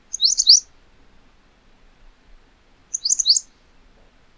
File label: wagtail; セキレイ; animals